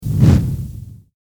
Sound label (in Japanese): 着火